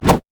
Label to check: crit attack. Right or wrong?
Right